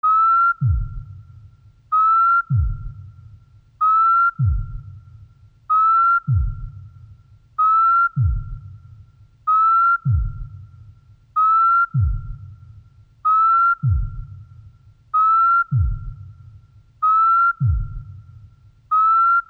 Capsule Oscillation (Dalek Destructor Fuse Bomb Countdown); The Daleks